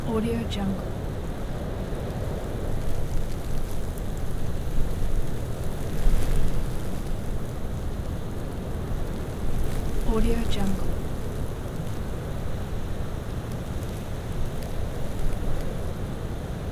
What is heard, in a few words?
winter wind blizzard